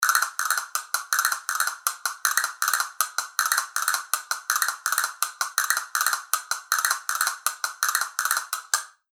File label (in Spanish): castañuelas fibra concierto doble caja